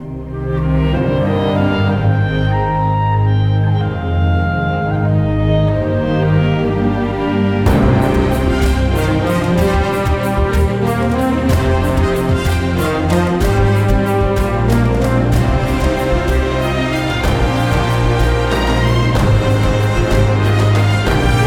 main music; Panda

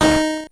multiplier D#4

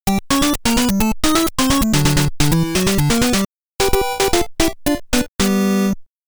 START (start BGM); Konami Famicom Chronicle Vol.1; Disk System Edition

